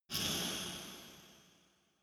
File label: dark resonator hit double soft